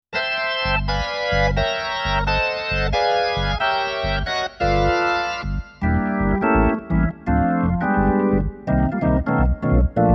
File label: pop organ